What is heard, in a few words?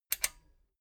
metal button stereo click disable fast